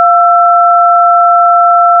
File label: DTMF 1 Ideal